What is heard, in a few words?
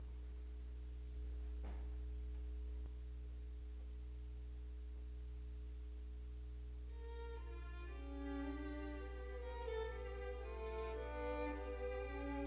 Six Modal Studies for Two Violins